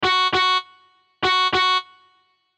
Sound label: sms; Spice; Xlife 431Q